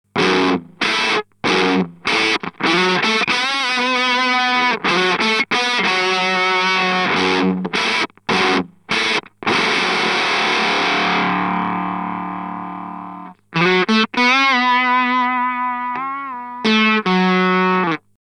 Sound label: Death by Audio Octave Clang Octave up Chords